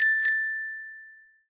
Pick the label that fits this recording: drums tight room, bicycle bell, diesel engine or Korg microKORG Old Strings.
bicycle bell